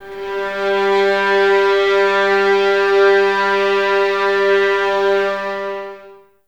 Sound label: violins G 3, string ensembles; Miroslav Vitous; 11 Violins